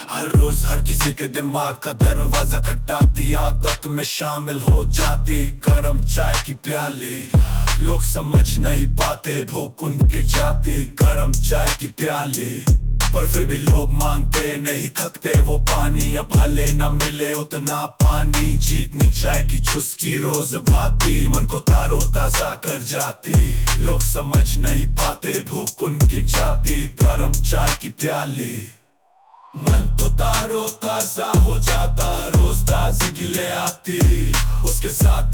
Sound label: tea song rap